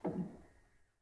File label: rel, Grand; bitKlavier; Binaural